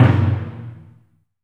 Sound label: oo daiko, taiko; AKAI S6000 CD-ROM - Volume 5